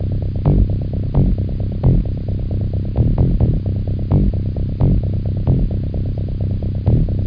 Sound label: Bass Loop; HotLeather2